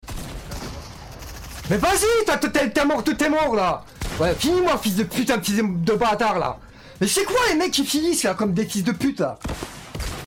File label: rage